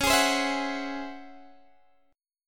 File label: Db Minor Major 11th Db Guitar Open D